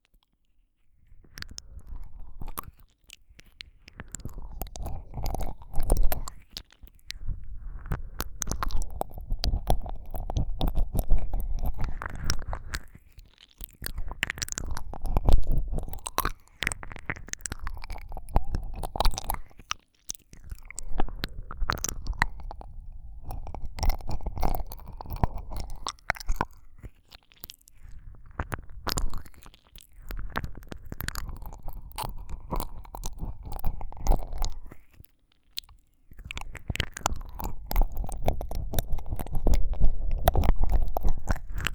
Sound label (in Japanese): 奥系耳舐め, ASMR